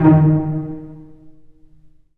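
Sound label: cello pizzicato D#1; Digital Sound Factory Virtuoso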